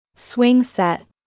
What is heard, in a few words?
swing set